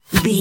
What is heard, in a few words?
bullet flyby fast